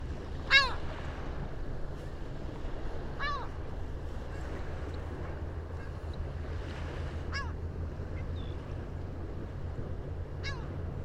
chayka heermanna larus heermanni

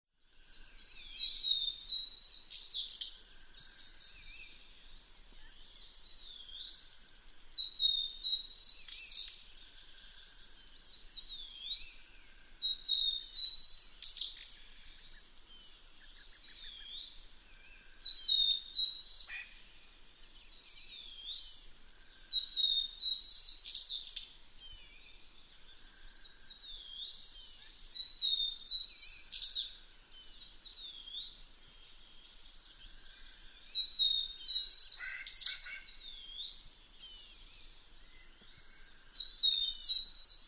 hodsoniya luscinia phaenicuroides, zvuki peniya ptits

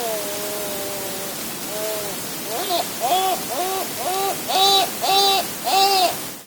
bolsheklyuvaya chayka larus pacificus, zvuki chaek

